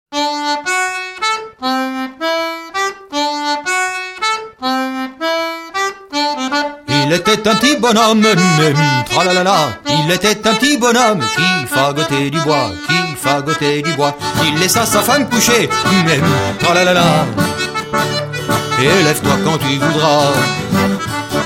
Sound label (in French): ronde de dol; filip montade